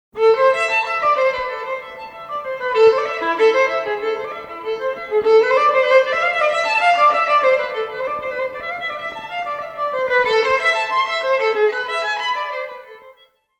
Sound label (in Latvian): vijole, sonate vijolei solo; j s bahs